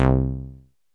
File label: Electro Samples 303BASS; Central Processing Unit; Electro Samples Vol. 2